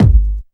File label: techno 2, bassdrum; Ueberschall; Techno Trance Essentials; DRUMS; BD TECHNO2